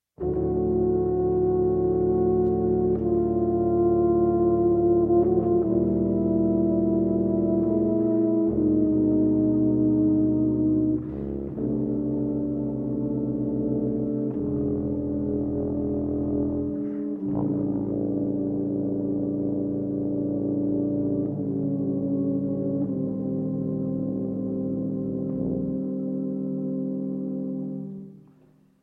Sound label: Tuba Quartet; Five Moods for Tuba Quartet